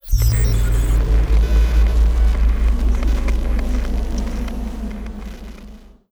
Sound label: encrypted comms; Stellaris; nemesis